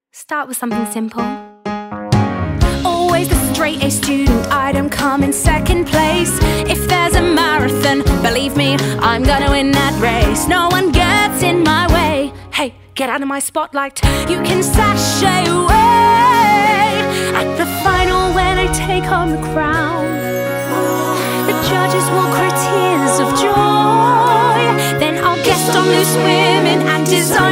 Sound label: vocals